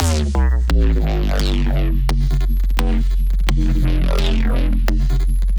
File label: 172 Sloppy Stabs F; Crowdsourced Sample Pack